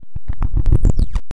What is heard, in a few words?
double jump; meat madness redux; godot